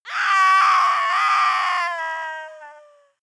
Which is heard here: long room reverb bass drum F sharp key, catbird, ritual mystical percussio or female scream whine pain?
female scream whine pain